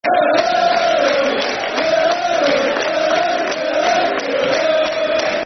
Da Da Dadada Da Da, Football Chants (Soccer Songs); Portsmouth